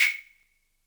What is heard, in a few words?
wood, Univox SR-95